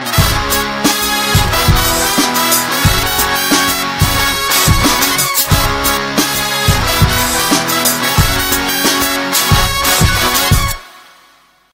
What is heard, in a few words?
Bgm Ringtone; Maan Karate; Pose; Tamil